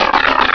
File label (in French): Cri